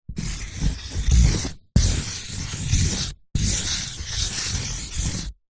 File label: office pen marker large draw on cardboard